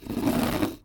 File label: Scrape